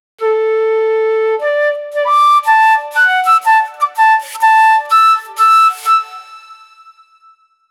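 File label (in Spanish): la melodía de la flauta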